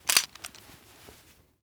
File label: sawnoff shotgun reload start; Rust